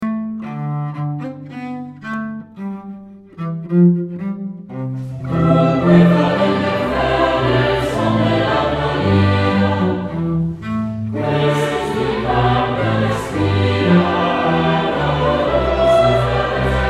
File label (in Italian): coro finale live